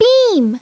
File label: Beam; Treasure Galaxy